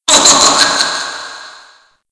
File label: Hangman Laugh Offcam; Playable Tutorial Level